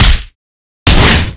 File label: Grab Slam